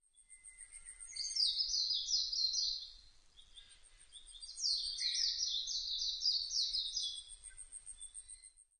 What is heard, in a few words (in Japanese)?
mejiro